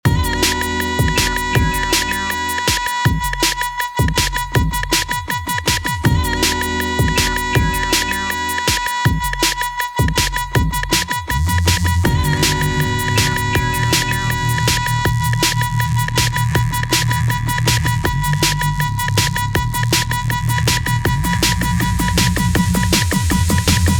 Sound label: Jungle